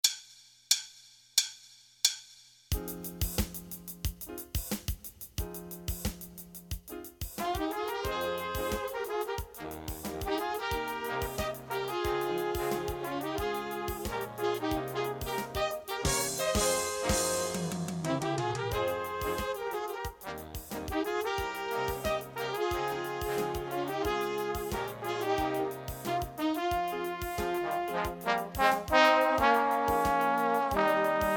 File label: every now and zen bass and guitar cut